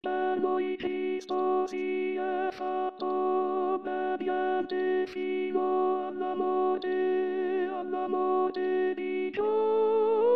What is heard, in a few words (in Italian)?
Tenori; Per Noi Cristo; pasqua